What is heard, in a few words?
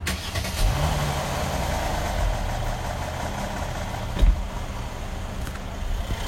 m276 non return valve inner chain new recording; glk class x204